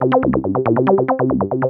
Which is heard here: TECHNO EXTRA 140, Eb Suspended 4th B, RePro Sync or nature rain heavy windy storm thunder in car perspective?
TECHNO EXTRA 140